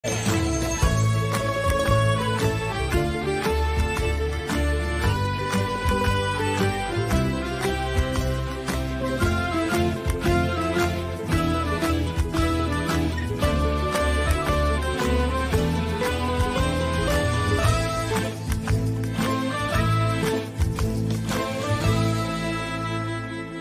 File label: theme ringtone instrumental ringtone; panchayat